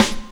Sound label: SD, Dance Mega Drums; Best Service